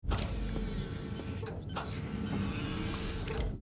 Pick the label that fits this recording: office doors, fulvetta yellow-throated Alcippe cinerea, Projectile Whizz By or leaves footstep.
office doors